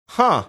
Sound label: "huh"